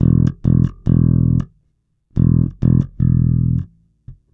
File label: 110 Pop Basic Bass E, E-Bass; Pop Rock